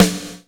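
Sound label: snare, Drums & Perc; AKAI S6000 CD-ROM - Ultimate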